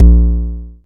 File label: analog tonal, kick; Ableton Foundation FreePacks